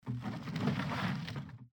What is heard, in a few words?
barrel open; baldursgate